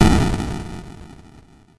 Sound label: twoom